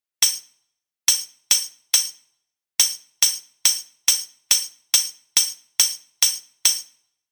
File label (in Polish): tamburyn rytm; Nagrania Lokomotywy